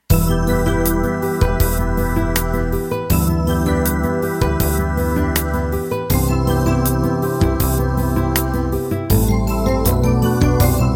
16 Beat Slow 70s; Bell Pad and Brushed Drums; 16beat ballads; This one will work for 1000's of 16beat ballads in many different styles of music

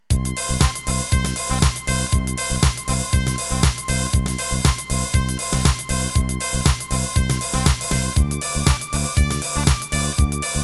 Tekno

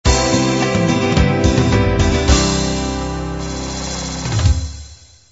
love 8beat end

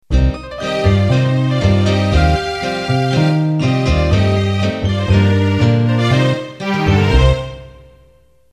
Tango Ballroom End; Band-in-a-Box

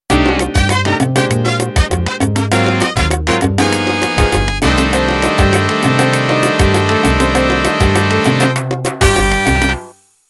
Salsa Intro

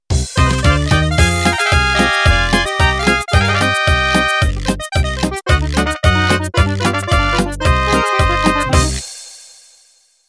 Mariachi End

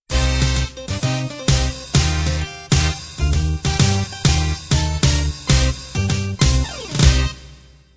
swing band end

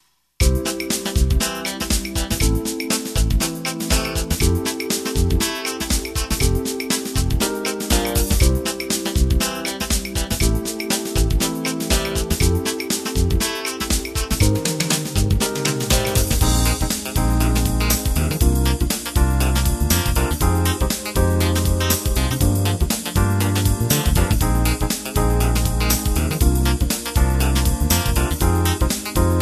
Groove

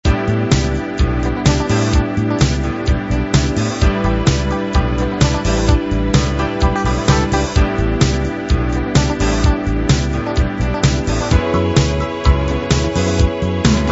70s disco

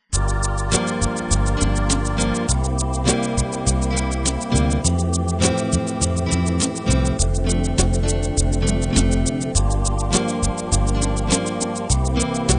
natural beat

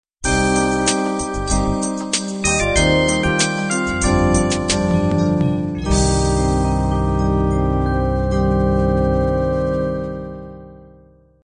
16 beat soul end

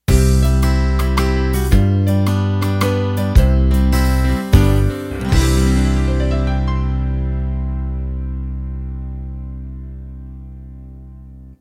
Country 3-4 End

